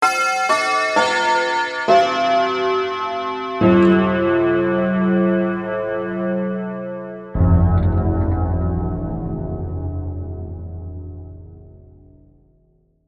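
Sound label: CLOCK BELL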